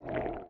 Hunger

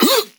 Vocal Pitched "Oogh"

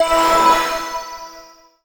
SFX - Challenge; uno

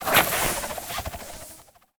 Rope Shooter

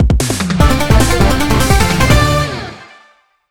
Stage Clear NES Remix; Ragebound Version; Ninja Gaiden Ragebound

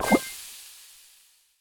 Cook

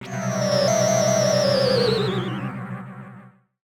Treasures

